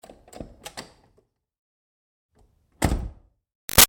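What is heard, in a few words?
Truck Ford Pickup Door Open Close; Trucks; Vehicles; Big Room Sound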